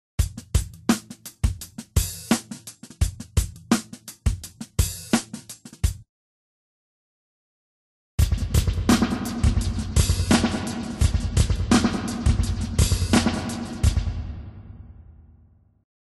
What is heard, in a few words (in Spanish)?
eco flotante